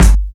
smooth steel kick drum sound g key

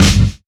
rich low end snare drum sound f key; Duration 0:01; snare drum samples

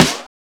studio snare drum sound E key

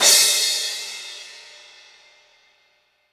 big crash cymbal c key

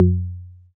low floor tom one shot f key; tuned drums f key

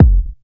fluffy kick drum single shot f sharp key

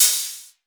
reverb open hat d key

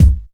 warm steel kick drum sound g sharp key

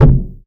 steel kick drum b key; tuned drums

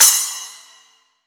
crash cymbal single shot B key; cymbal drum samples

